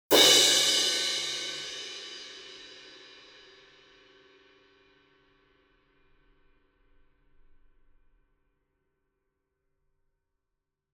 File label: CYMBAL Crash Hit Hard Beater Medium; boom library; Cinematic Strikes Construction Kit